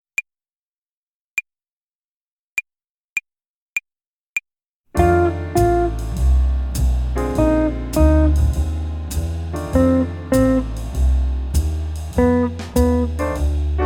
Blues-Tonleiter Übung 1, Play-along Slow, Gitarre; Jazz Club

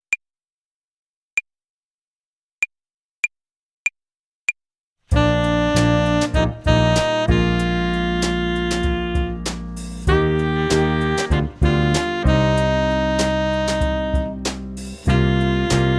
Tempo und Rhythmus Übung, Play-along, Jazz Club Tenorsaxophon

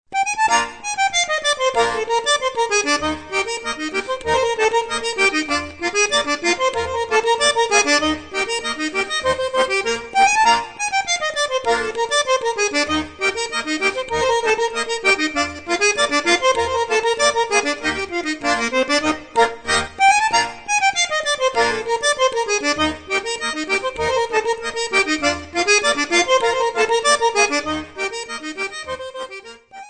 Schottisch

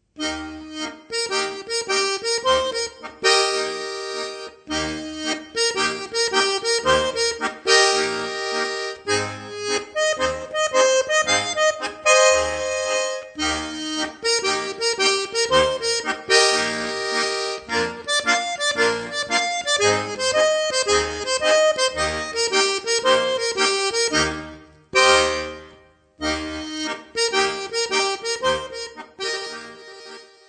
Schwyzerörgeli Blues

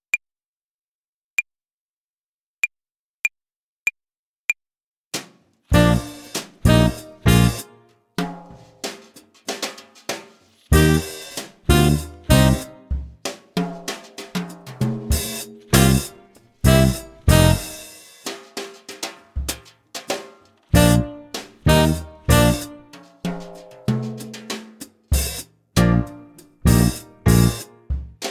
Tempo und Rhythmus Übung, Play-along, Jazz Club Tenorsaxophon

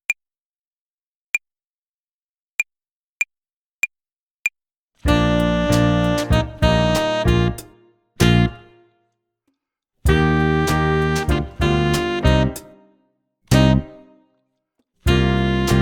Tempo und Rhythmus Übung, Play-along, Jazz Club Tenorsaxophon

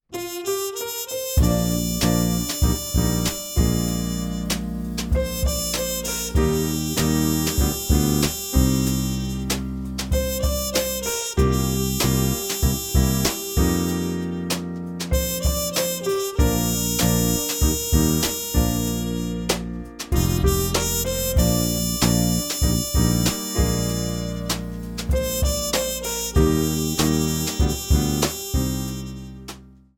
Jazz Club Schlagzeug

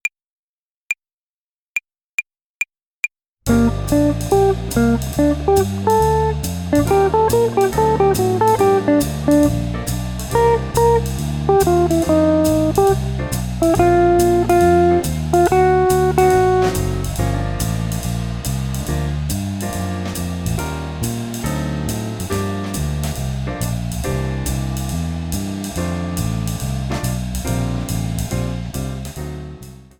Swing-Phrasierung Übung, Play-along, Jazz Club Gitarre

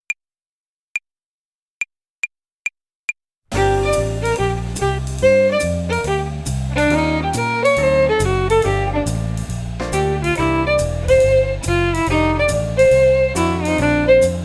Swing-Phrasierung Übung 8, Play-along, Jazz Club Violine

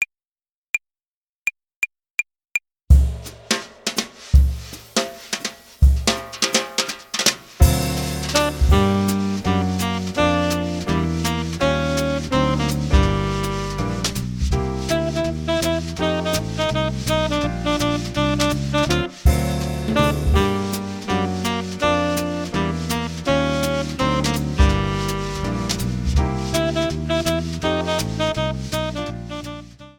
Play-along, Jazz Gitarre; Jazz Club

